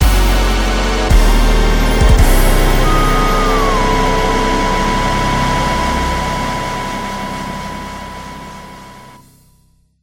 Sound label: gameover big; Manamon Music; Manamon 2